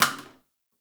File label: Janitor Plastic Spray Bottle; Warframe; Ambience; NineteenNinetyNine